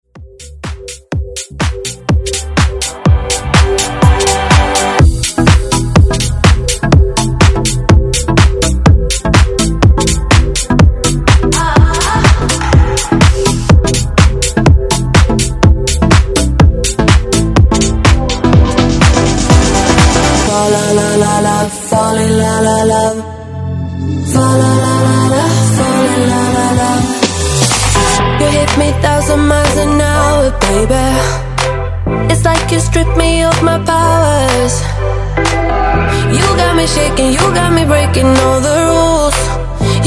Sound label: House Ext Redrum